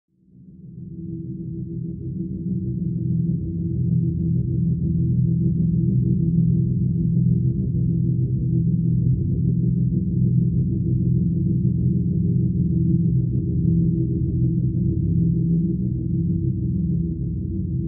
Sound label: Atmospheric music